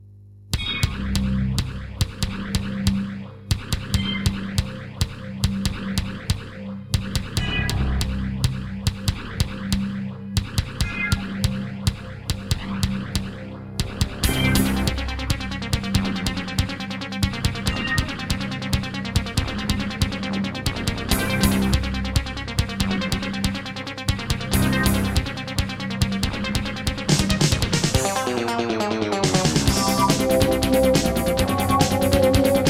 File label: Creative Wave Blaster II; sigil doom episode 5